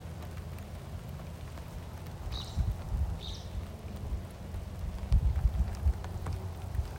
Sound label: Couchs Kingbird; Berwick LA